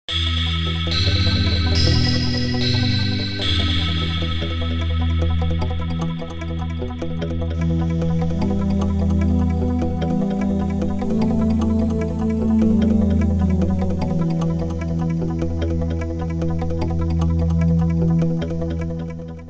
Suspense Phrase

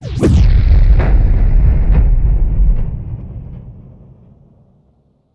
Cannon Explosion

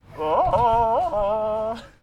Man Tarzan Roar

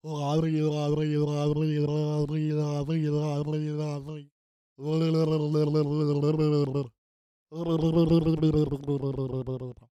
Tongue Sounds

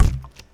Water Bottle Snare Desk Deep Kick

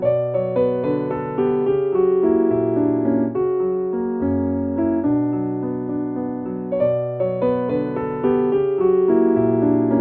Piano Loop

